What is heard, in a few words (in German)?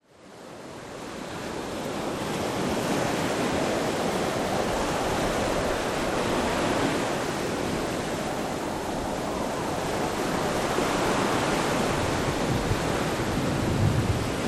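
Ostsee, Stürmische Ostsee Meeresrauschen; 6 Stunden Meeresrauschen bei Sturm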